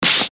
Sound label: hit; Carry